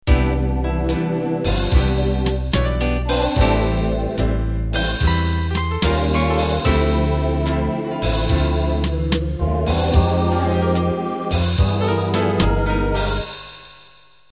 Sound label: Slow Jazz Intro